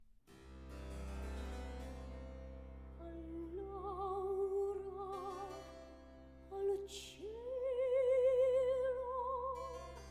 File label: baroque duet